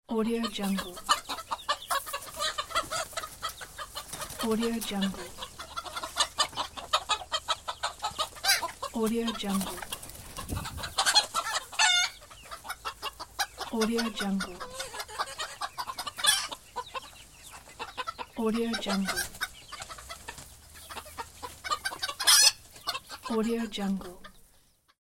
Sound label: chicken